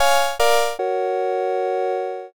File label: ending